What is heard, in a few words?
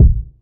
natural kick sample a sharp key; kick drum samples